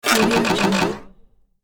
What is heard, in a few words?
future device bangs